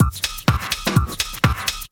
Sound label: drumloops 125; PS-28 Vocal House Samples